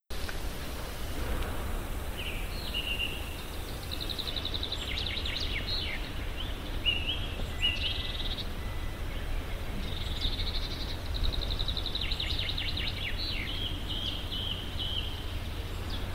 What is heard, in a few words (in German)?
Wald; hirsch-wald